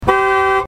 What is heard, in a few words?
Nissan 300Z